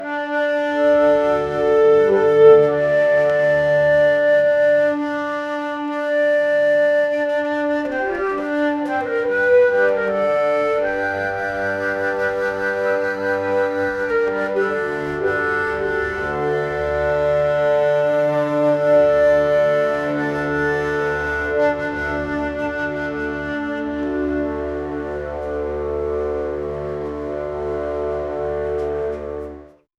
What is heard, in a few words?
majestic orchestral Indian